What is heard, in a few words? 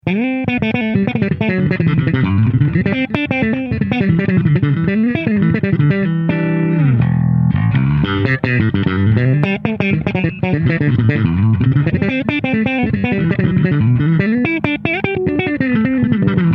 Vintage chop